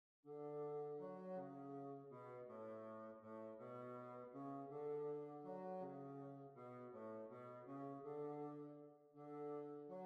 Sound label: Waltz